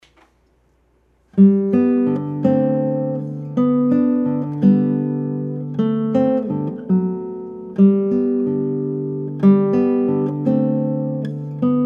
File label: Waltz In G